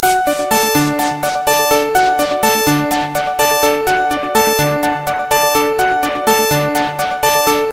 Alarma Standard

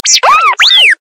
Alarma espacial; alarmas

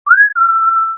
Silbido corto de alerta; Notificaciones

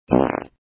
Gases; graciosos

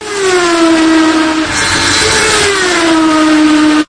Carrera Formula 1

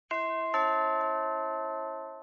Timbre; Notificaciones

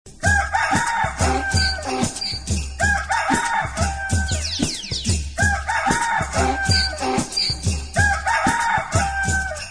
Animales remix, ringtones graciosos

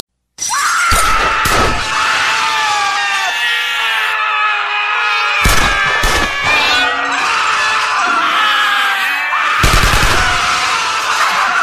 Alarma Mix; alarmas

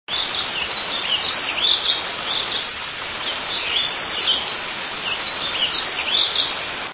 Pajaros y agua

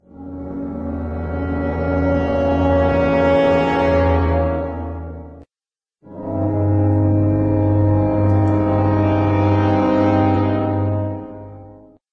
Sonido de suspenso

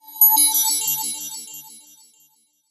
Sonidos del cielo; tonos cortos; Notificaciones

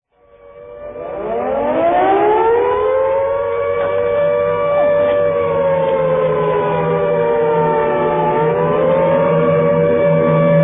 Sirena antiaerea; alarmas

